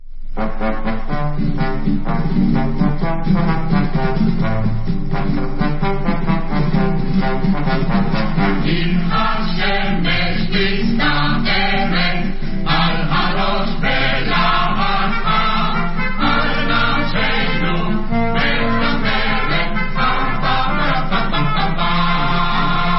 quintet; giora kadmon; gilboa

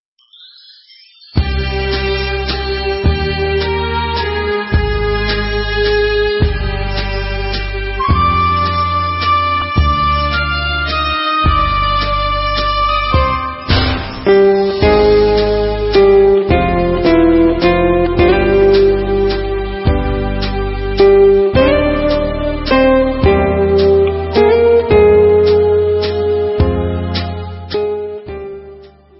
Instrumental

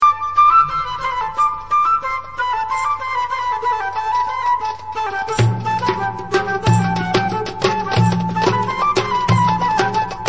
Debka, Instrumental

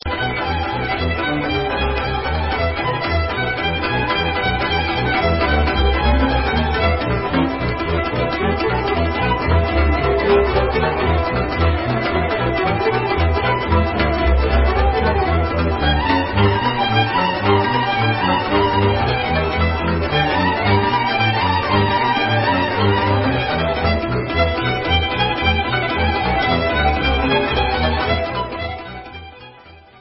Sirba, Rumanian Instrumental

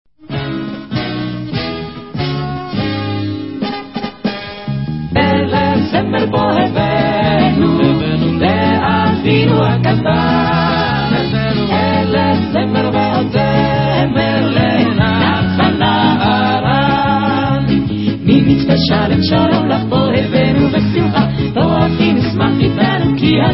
Hora Vocal

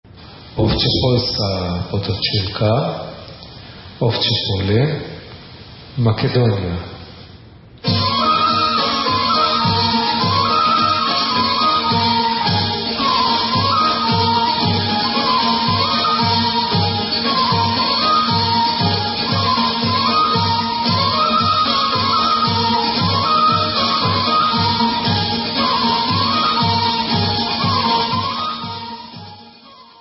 Macedonian Instrumental; Ovcepolska Potrculka

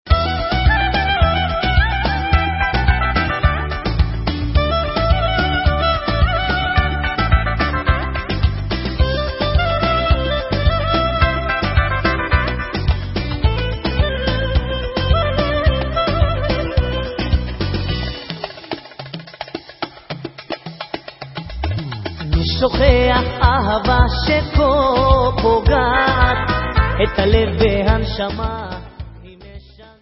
Debka, Instrumental

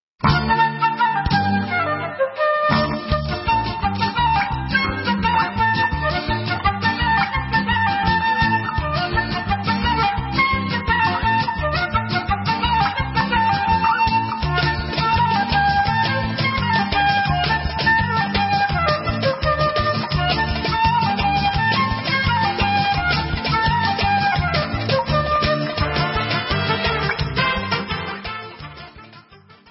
Instrumental

